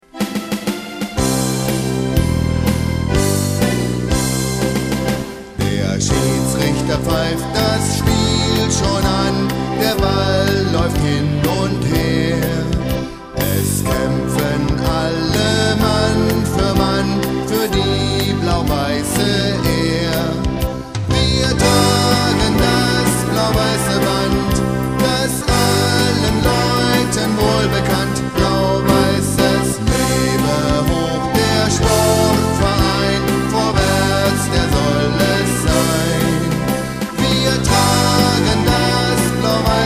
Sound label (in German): Lied Gesang